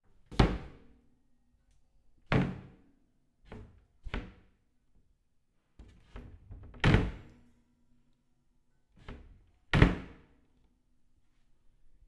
Household, Desk, Drawer, Slide, Open, Closing, Hotel, Vietnam; Starbites